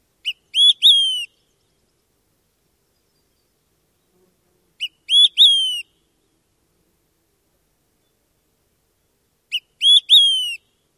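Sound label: Olive-sided Flycatcher, song number 2; NY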